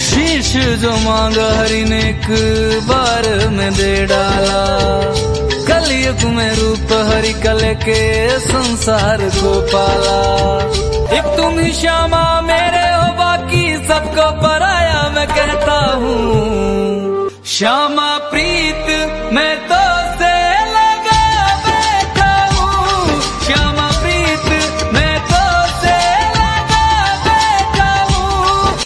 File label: Hindi Ringtone